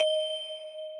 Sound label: bell